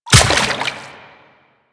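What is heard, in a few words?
step on water, footsteps; Warframe; The New War; Kahl; Quests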